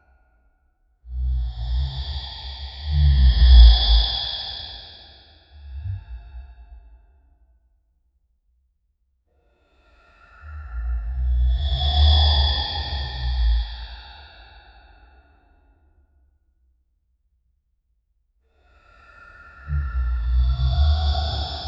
the snoring sound of a, cave

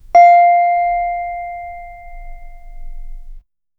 a clean dry audio recordi, handpan

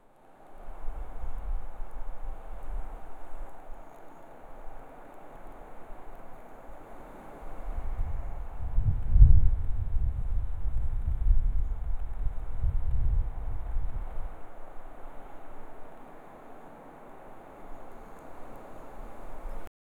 arctic quite wind blows

